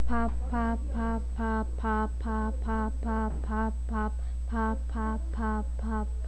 pop

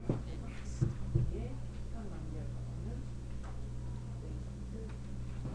laugh

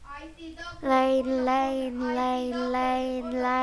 rain

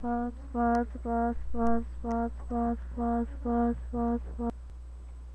buzz

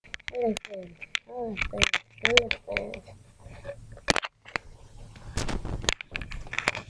elephant

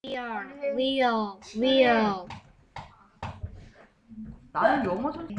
wheel

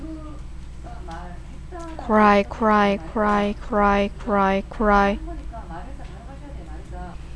cry

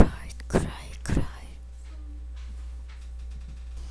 cry

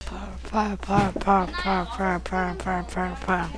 purr

